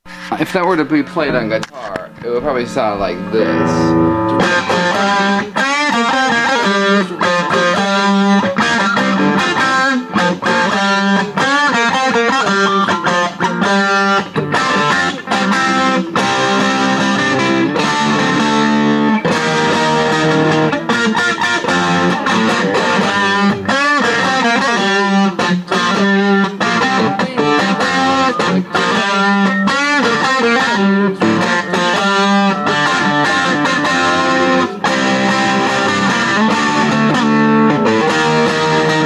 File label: guitar